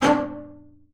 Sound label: D4, BASS SPICC; Xsample VOL-5